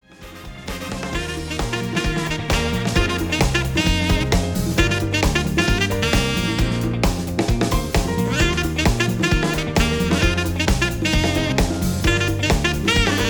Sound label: Jazz Fusion Arrangement; Dolphin Shoals